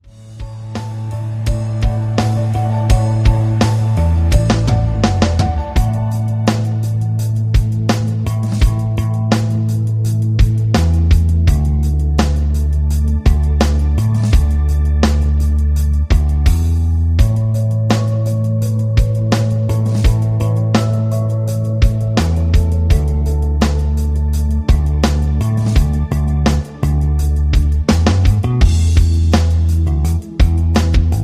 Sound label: rhythm